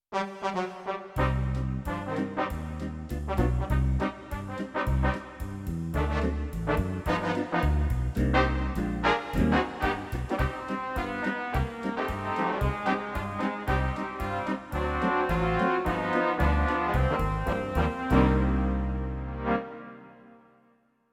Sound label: Twin Trumpet, accordion; Roland V-Accordion soundpack best of brass